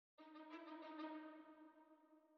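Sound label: CAVE Violin Staccato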